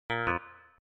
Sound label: roulette buzzer